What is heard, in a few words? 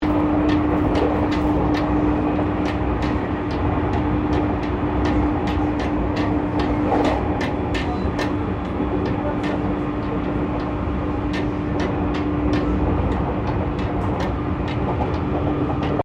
Train Aomori Series E731 Express Connecting Platform Operating Loop, Japanese Trains